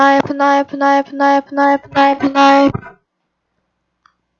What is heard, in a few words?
knife